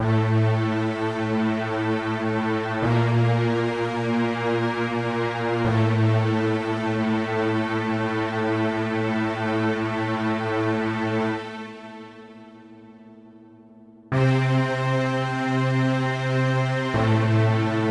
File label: Loop Fade Nobase; King Lucas